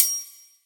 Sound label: Kitchen Foley FX Drums; Antidote Advent; Kitchen Foley Sounds & Loops